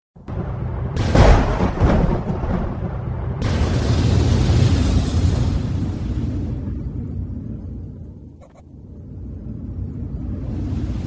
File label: thunder booms sound effects; fx